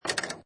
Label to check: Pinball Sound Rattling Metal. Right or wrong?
Right